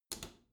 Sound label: bathroom cabinet close soft